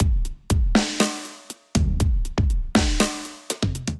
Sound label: Sense 7 Kit, Drums Acoustic